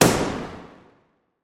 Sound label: gun shot with reverb; soundscrate